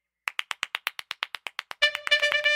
Aplausos

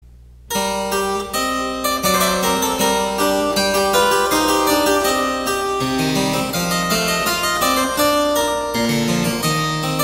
Clave

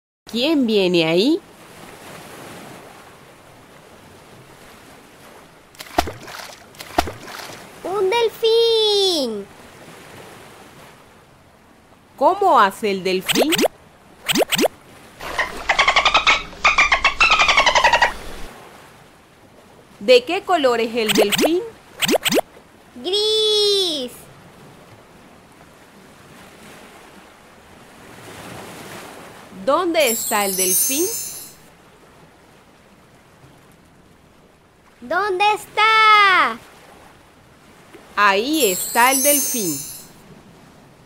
Animales Marinos para niños Sonidos de Animales

